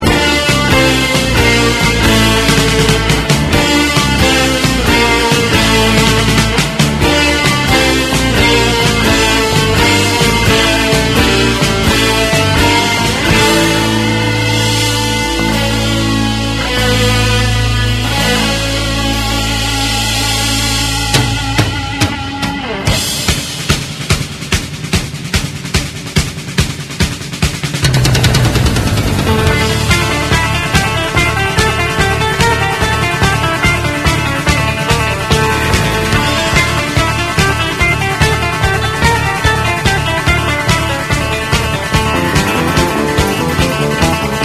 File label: Toccata